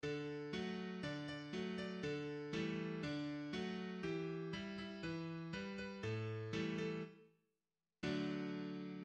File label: Tonal